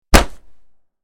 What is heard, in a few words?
Bullet Hits Body Dry I